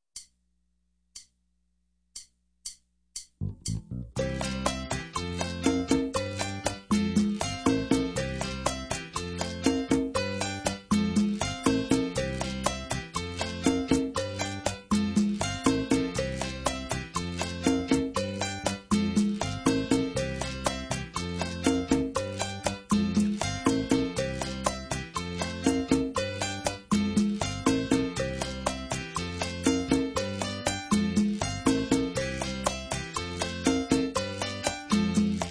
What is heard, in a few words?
Latin Groove